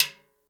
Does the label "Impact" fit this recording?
No